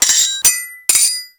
SWHIT, SWORDHIT; Total Control - Action Cutz

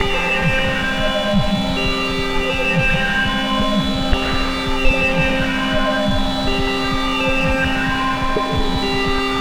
Ambient Melody; Drums & Drones

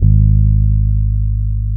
HOOD G#2, Bass, HOOD BOOM; Spectrasonics; Bass Legends CD 1

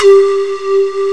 PANMALLET G4; East West - Global Sounds; M1

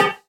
Hi Bongo LoFi

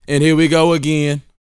VOX, R&B Dance, HIP-HOP

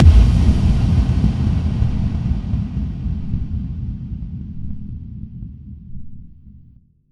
FX Kicks

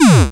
synth, c, Techno Synths; Dance Synths

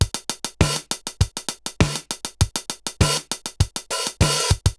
RX17 (100BPM), cyborg beats; musicradar

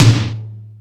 Lazer Drums, E-MU Pro-Cussion; drum machine

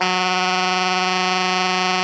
ALTO GR F#2, Saxophones; Greytsounds; Philip Wolfe David Crozier's Saxophones

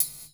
PERC GATED; Crypton; DrumBase5000

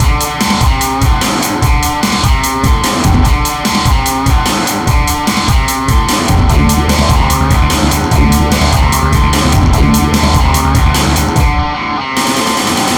Hardcore Breakbeat, 148 Dmaj; Big Fish Audio; Hardcore Breakbeat Action; Get Up

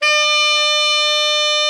ALT, Sax, ALT.VEL-FALL; Giga Samples Collection

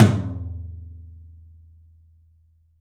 TOM; 3D Sound; 3D Drums

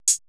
drum, 80s Drum Set, DRUMCOMPUTER

